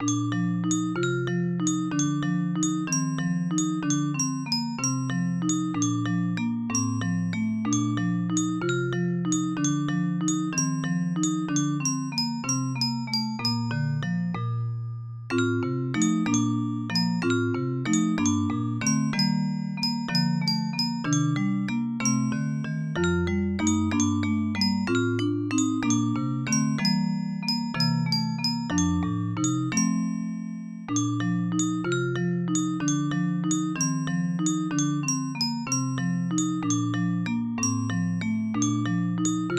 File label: Music Box